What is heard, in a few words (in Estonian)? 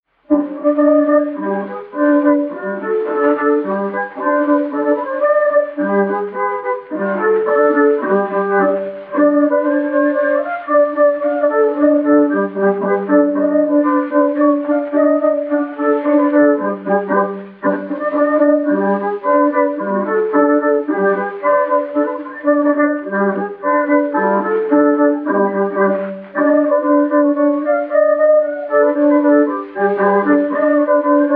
Polka, hiiu kannel folk; Laane Nigula; Mart Kaasen